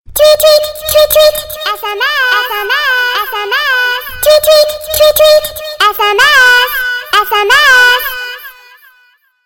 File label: sms music